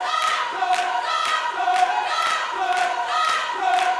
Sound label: 120 CIR JOY, Vocal Gospel CIRCLE LPS